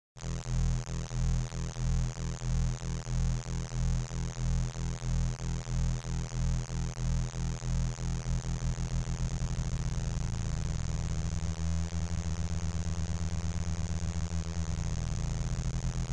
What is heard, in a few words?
Modular Filter